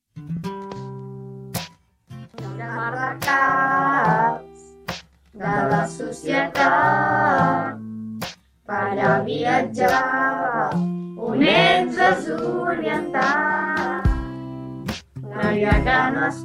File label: Official Instrumental